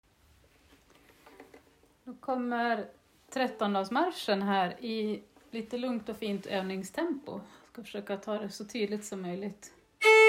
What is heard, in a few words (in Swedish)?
Trettondagsmarschen ovningstempo